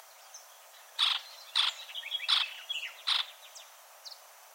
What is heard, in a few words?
Coccycua pumila Cuclillo enano